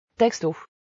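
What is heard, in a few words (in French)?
Texto Voix, fichier de notification; samsung s7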